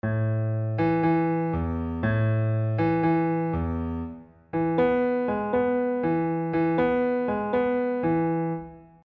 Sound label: bass